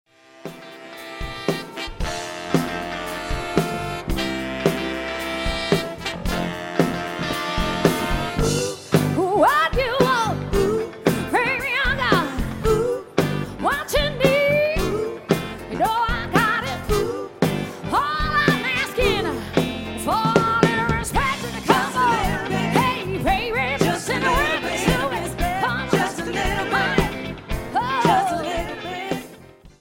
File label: KICK Band